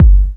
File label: KICK, Big Beat; Big Beat Samples & More; AKAI